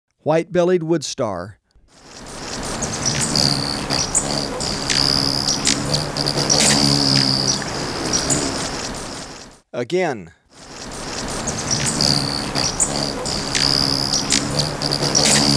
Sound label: White-Bellied Woodstar, Birds of Ecuador; S. America